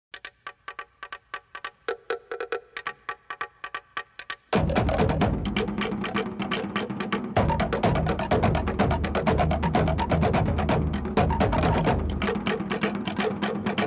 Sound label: TAHITIAN DRUMS